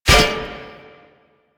Glyph Puzzle Disc Land; Warframe; Duviri

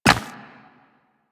Run Rock, Footsteps; Warframe; Operator

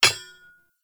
Ambient Blacksmith Hammer Impact; Sprout Farmers; Midrath

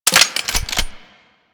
Double Barrel Shotgun Fire Mech; Weapons

